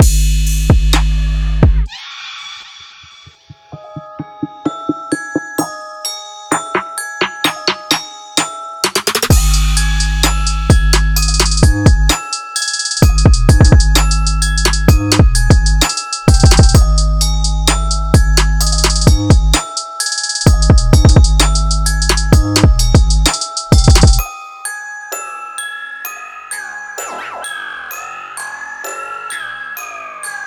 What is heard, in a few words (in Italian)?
kick alto